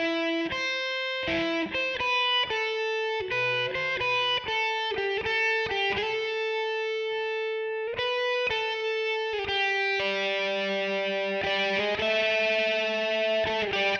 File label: natural minor original solo